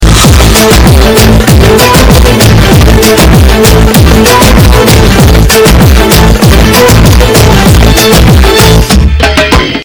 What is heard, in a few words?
bass boosted